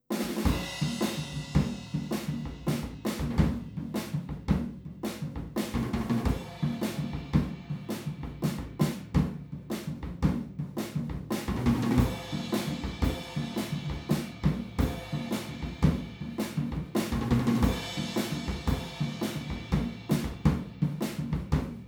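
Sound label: PZM Omni stereo Drums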